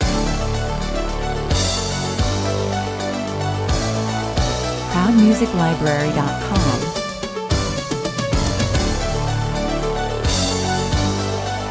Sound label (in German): Aufbrausendes Intro, dann wirds gemäßigt groovy, unaufdringlicher Hintergrund... Rock style synth, 110 bpm; Rock style synth starts the opener of this track followed by a Non-stop Rock